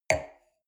wine glass place table soft short; soundpool

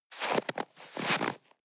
step snow scuff; schritte

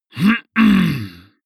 male harrumph angry short; menschen; koerpergeraeusche; soundpool

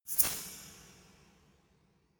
resonator hit double hard

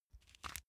small pepper grinder soft

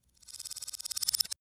thin metal vibrate reversed long; industrie wirtschaft; metall

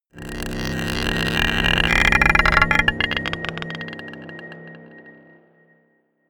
scrapes marble pot dark, drones

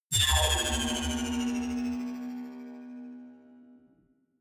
energy field vanish

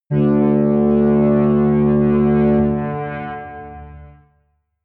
synth tremble bass, drones

